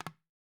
ROSEWOOD, African Drums

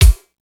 MPC 60

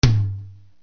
RX7 FUSI TM2, Yamaha RX-7

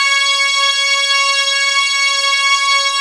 OMNIVERB, ARP Synths, Analog Odyssey

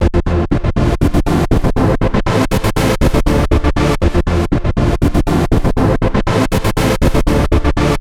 Trance Synth

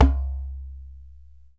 DJEMBE, African Perc; E-MU Producer Series Vol. 7 – Old World Instruments

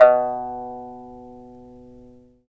PIPA B3; Spectrasonics; Heart of Asia

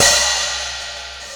Vintage Open Hi-Hat; Drum n Bass; Hi-Hat One-Shots; KJ Sawka; Drum n Bass & Beyond; Drum Tool Box

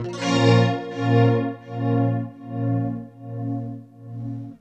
FX GUITAR; USB Soundscan vol.31; Miro s Grooves; AKAI

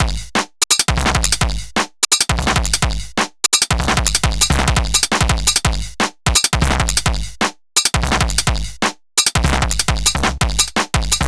Bat Nare Beat, Electronic Beats; KJ Sawka; Drum n Bass & Beyond

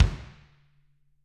BD, Drums; Bob Clearmountain Drums I

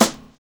VINYL SD, Drums Dance & Groove

